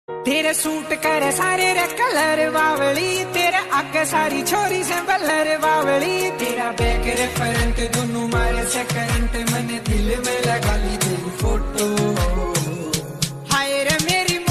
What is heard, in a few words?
bunny ringtone